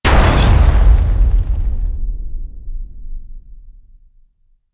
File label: Big Stone Crush Impact